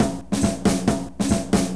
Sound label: Groove 137; Dance Mega Add On Grooves